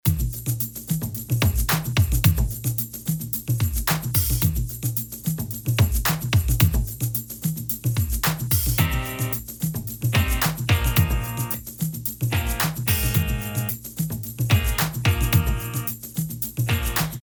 Accord

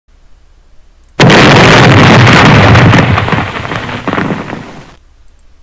bruit d'explosion

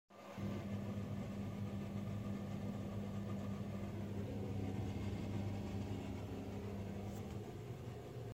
Chauffe Eau

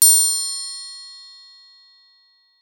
instrument musical

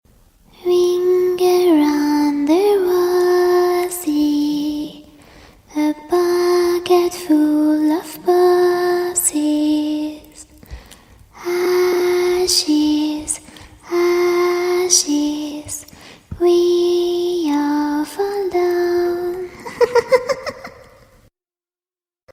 ring a round the rosie chanson d'horreur

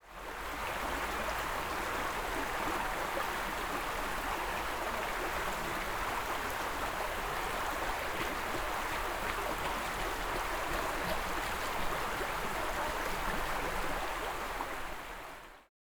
ruisseau et course

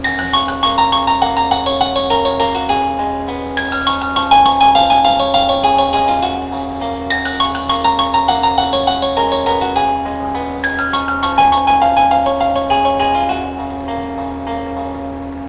musique

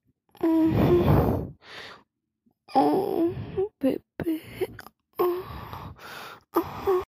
Bruit de sexe

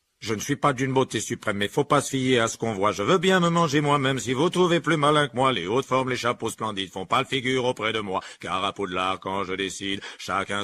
chanson du choixpeau; hp tome 1

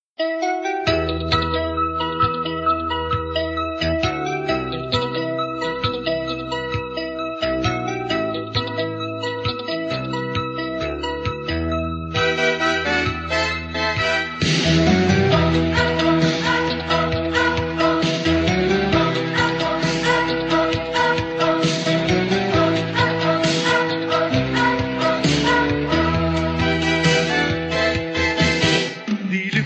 generique

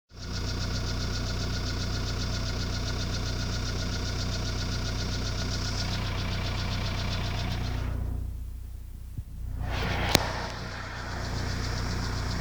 Clac; golf4

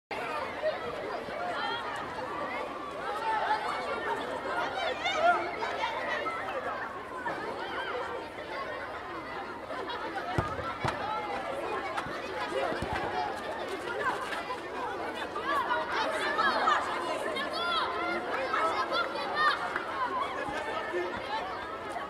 Bruitage cours de récréation

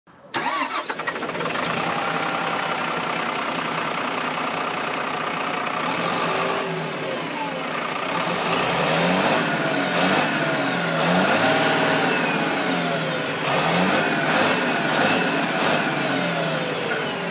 moteur de bus démarrage

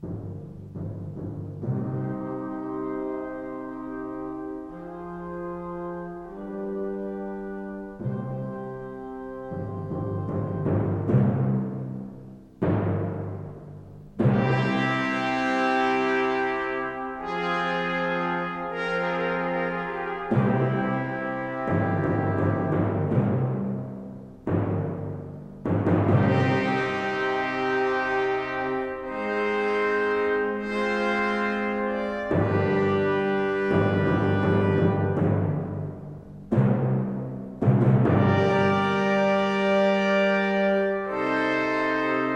Ciné classique

